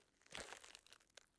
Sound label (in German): Kies Schritt; Gods of Akragas